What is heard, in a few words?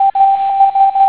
telegraph; Motorola MPX200